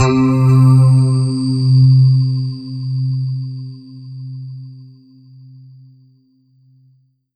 Kawai K5000W Sphaera C3

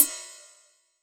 Ride Cymbal

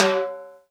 Roland R-8 High Timbale

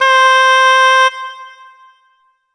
Roland JV-2080 Shanai C5

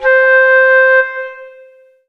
Kawai K11 Flute C5

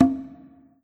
Yamaha TG100 High Conga

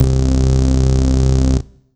Kawai K5000W Buchla C1

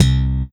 Casio CTK-611 Touch Bass C2

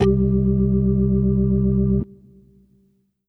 Korg M3R Perc Organ C2

